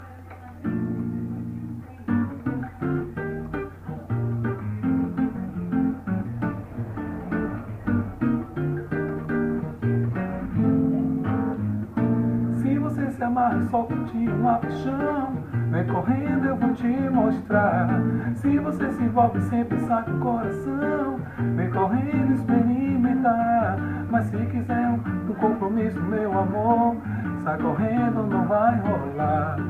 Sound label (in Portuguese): drill solteiro